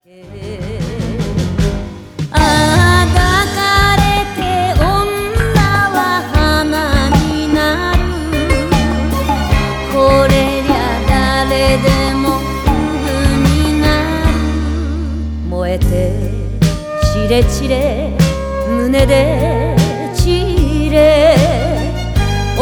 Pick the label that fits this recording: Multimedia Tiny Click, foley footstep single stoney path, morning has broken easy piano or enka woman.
enka woman